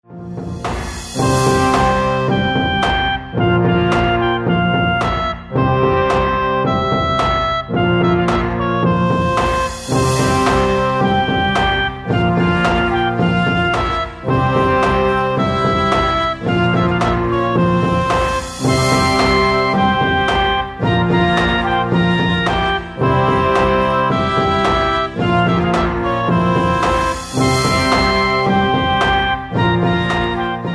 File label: Sand Dance